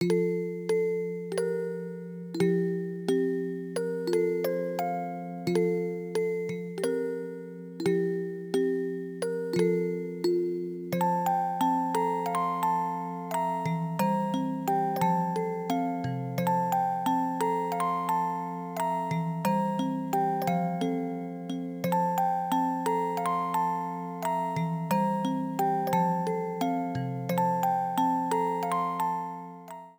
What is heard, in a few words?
End Musicbox